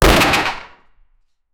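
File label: heavy metallic gun dropped, drop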